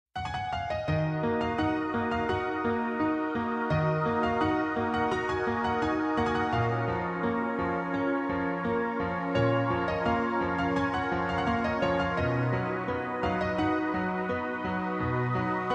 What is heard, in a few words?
new rap